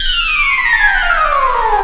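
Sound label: synth, Production Effects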